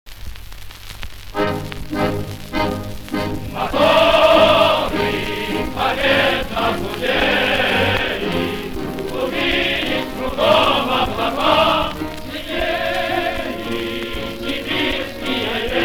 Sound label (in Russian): Довоенные грампластинки